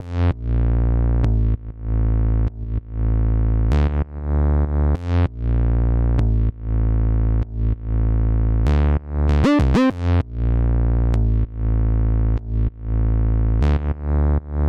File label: Freeze Analog; 909 dnb; Processed